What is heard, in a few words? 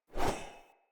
Sphere Polearm Heavy Whoosh Fill; Weapons; Player